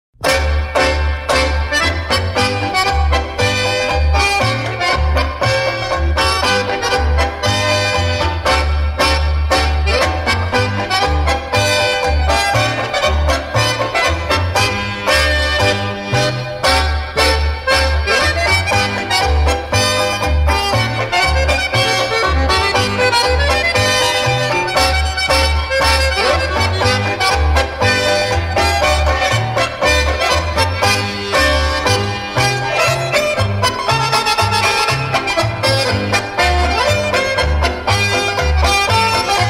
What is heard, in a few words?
Jolly Polka, accordions